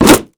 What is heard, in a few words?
Wood Crack